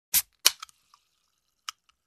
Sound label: Soda can opening